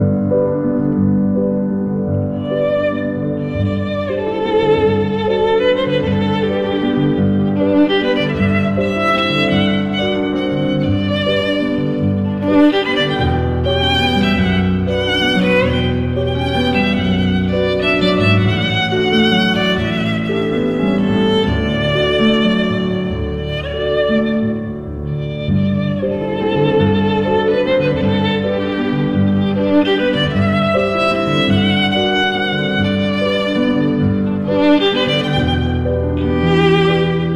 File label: piano